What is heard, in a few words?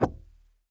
noise muted strum, swag bass